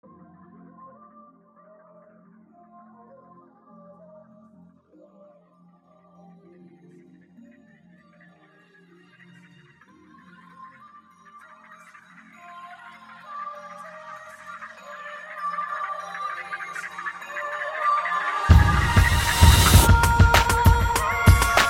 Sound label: Calling remix instru